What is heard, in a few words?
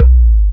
Kick; RnB